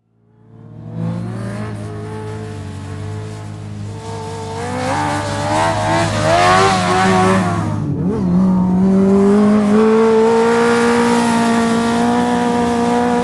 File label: sprint car rev accelerate explosive repeated racing high rpm laps around track rev idle; Sonniss GDC